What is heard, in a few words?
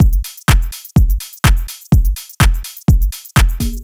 A Slice Of Pad, Drum Loops, 125 BPM; Sonic Foundry (Sony Creative Software); Underground UK House & Electro